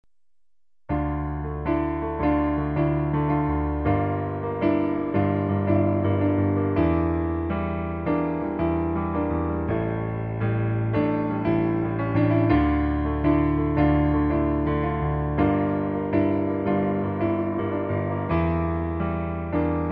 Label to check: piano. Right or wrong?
Right